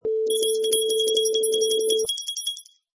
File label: Jingle Bells